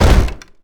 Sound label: impact wood medium